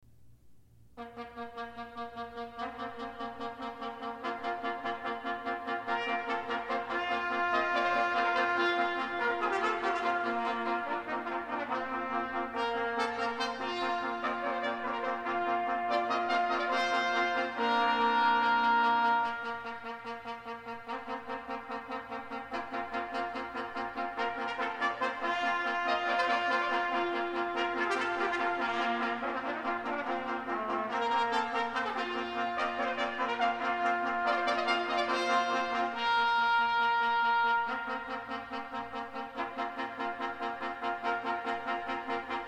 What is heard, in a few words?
trumpet flourish; University of Kentucky